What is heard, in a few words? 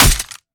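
melee character gun large steel; viper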